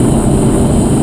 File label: fly; steel